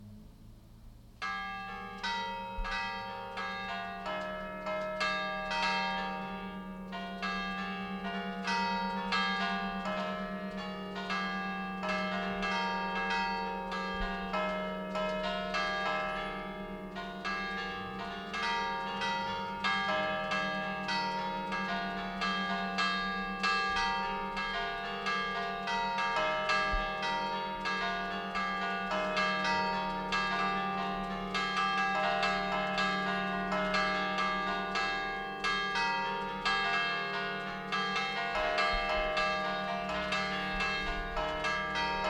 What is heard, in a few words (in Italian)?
Campane Genova Molassana alta; Liguria; Archivio Balma Campane Liguria; Polifonia